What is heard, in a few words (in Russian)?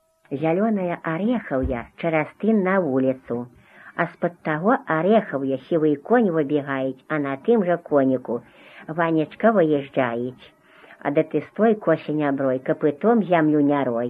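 Жнивные песни Себежского района; д. Дедино; Жнивные обрядовые; "Зялёная ареховья чэраз тын на вулицу"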